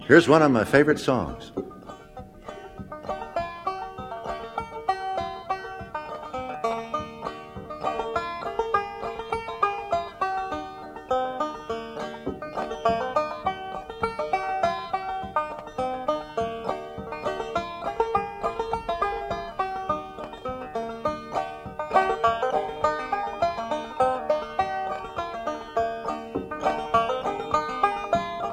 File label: banjo; Columbus Georgia